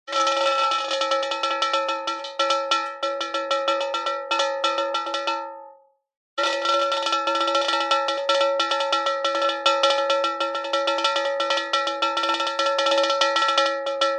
nature water drips long metal resonate ring hollow